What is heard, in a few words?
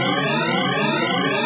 klaxon